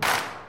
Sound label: clap